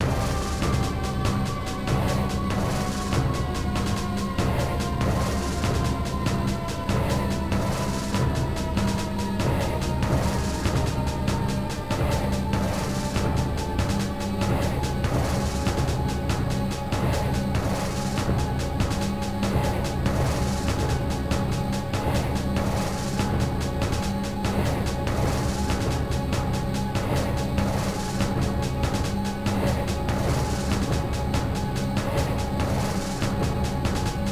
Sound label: Transmission (Pattern Clear - Bad)